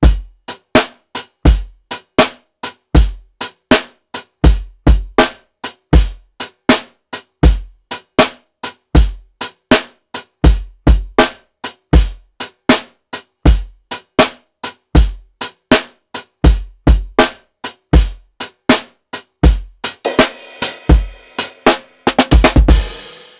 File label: PICCOLO 80 SWUNK, drums; Basement drums vol 2